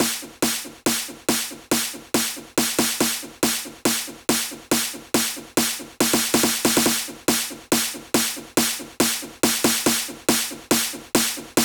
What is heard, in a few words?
140BPM SNARE 16 Bars; Vengeance Samples; Snares&FX Pairs